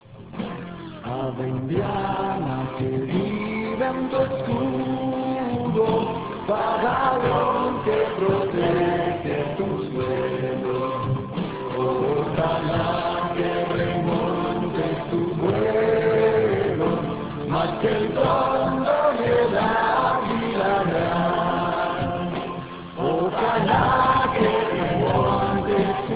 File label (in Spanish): himno